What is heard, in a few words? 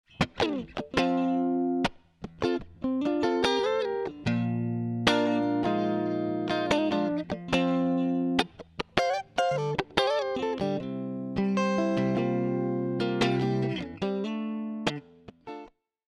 Elec Gtr Clean; Preflex